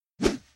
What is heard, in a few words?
Transition slide; Majhong Royale